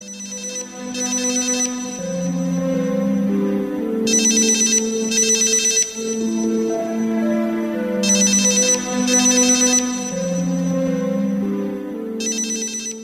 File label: Sleepy Sound Alarm; Wecktöne